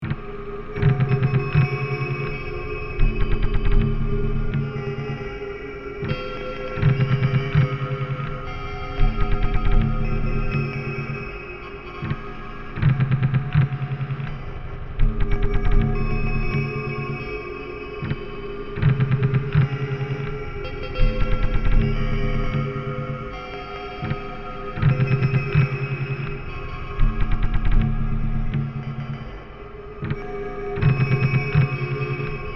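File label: Subtle Beat From Ancient Arabic With Slow Key Chimes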